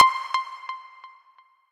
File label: combo; quatuor